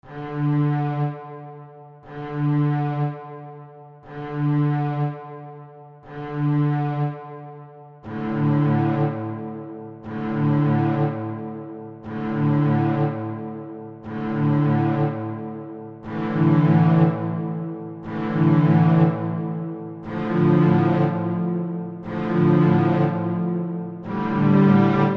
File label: Classical Test